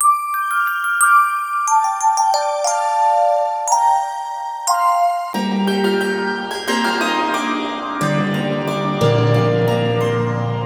Melodic D 90

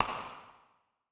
Reverb

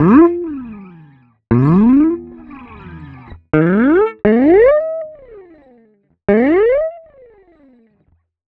Guitar Slide Effect Up

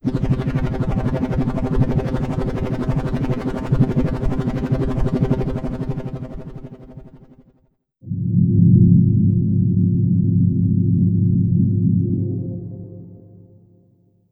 Atmos C

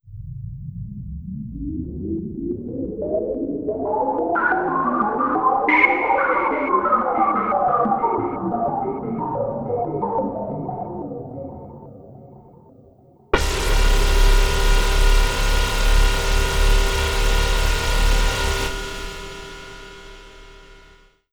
Atmos

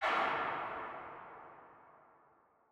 woodhit

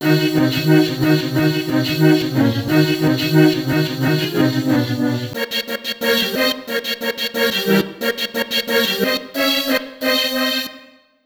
Melodic C

